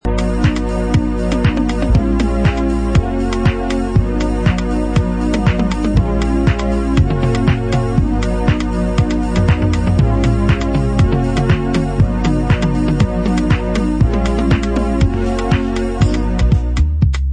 instrumental mix

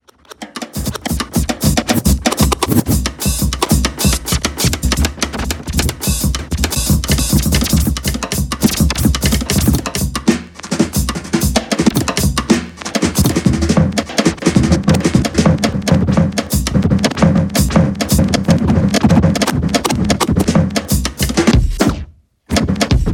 Turntable Solos; Discrepant

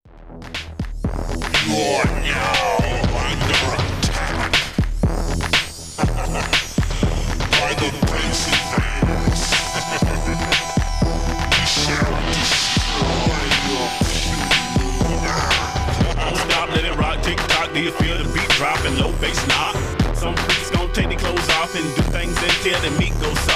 bass invasion

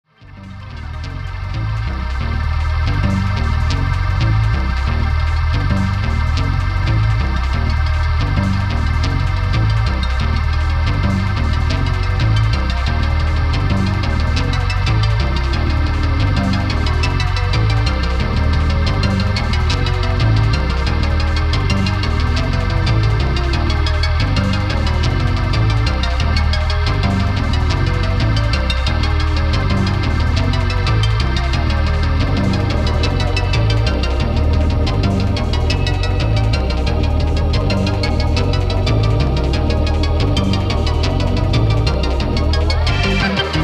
moog

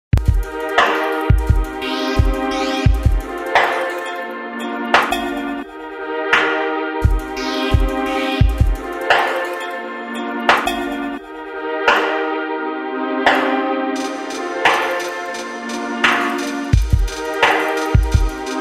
perc version

